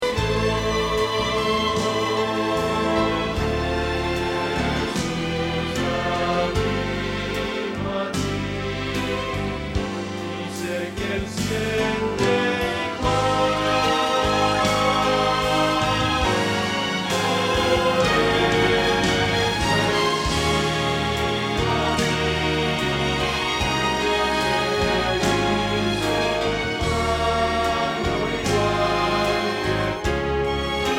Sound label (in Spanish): Bajo